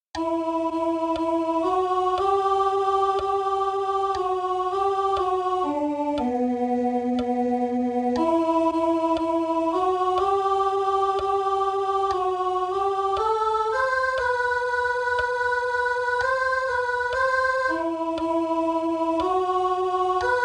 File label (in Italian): Tu sei la mia vita, BAS